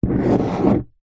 office pen ball point draw shape paper on plastic table